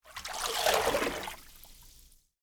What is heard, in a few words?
water move